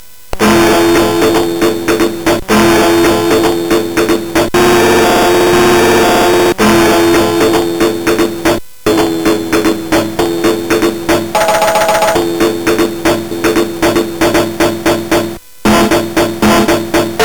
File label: Short Thrill, CSG8580R5; hvsc